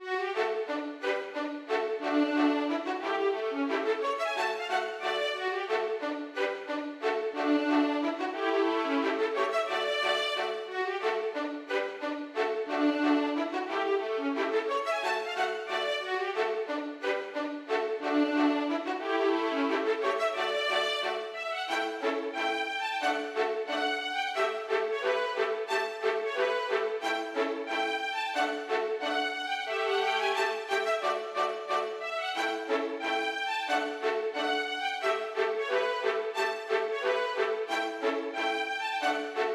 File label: Polka; Ursin